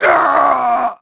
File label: DEATH; NIXON; Pulse Racer